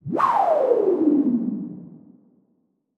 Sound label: Blow